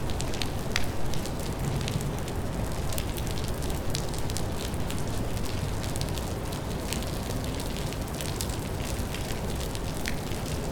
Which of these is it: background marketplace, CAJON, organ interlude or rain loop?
rain loop